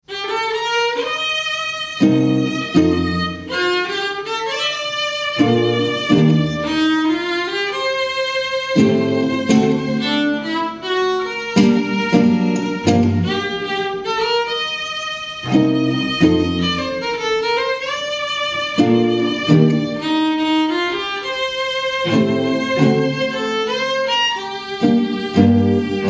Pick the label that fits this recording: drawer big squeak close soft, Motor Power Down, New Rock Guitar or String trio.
String trio